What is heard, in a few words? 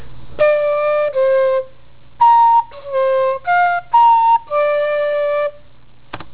train; 95 NY